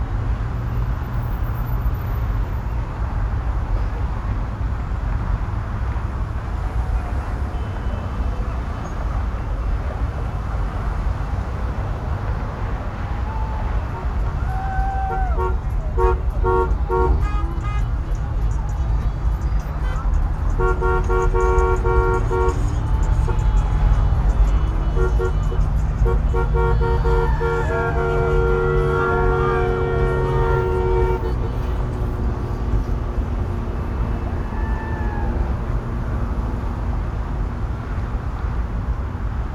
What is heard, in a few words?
Urban traffic special event car horns shouts; urban and suburban; boom library